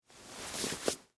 Dress Shirt Wipe, Hand; Foley; Clothes; Dressing; Human; BlastWave

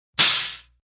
Metal Hit; Multimedia

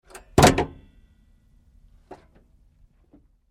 Truck GMC Savana Hood Open; 2010 GMC Savana; Trucks; Vehicles; Big Room Sound

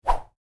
Woosh, Whoosh: Short, Light; Whooshes; Sound Design; Stuart Duffield

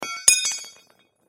Metal Rod Drop, Foley Metal Rod Drop Pipe; Materials; Blastwave

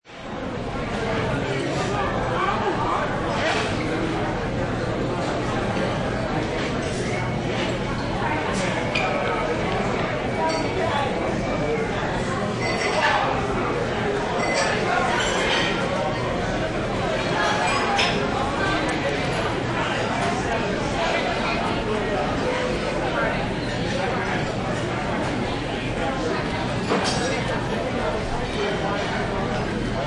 Fifth Avenue Restaurant; Restaurant Sound Effects; Lynne Music

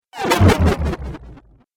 Dubstep Audio Laser

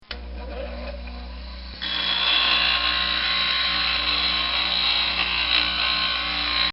Grinding Metal; Materials; Bobby Cole

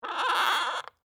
Wood Creak Squeak, Old, Handle; Foley; Squeak Sound Effects; Squeaks (Sound Effects:Objects); BlastWave

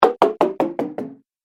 Game Fx Descending Hits, Video Game Sound Effects

